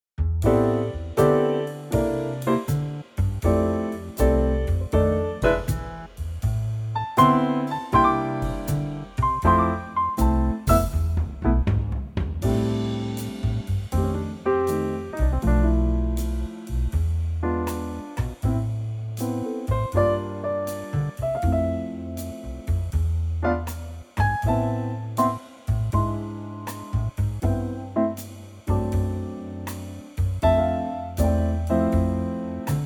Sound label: Am swing